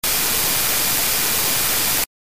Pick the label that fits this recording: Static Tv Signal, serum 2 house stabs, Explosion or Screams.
Static Tv Signal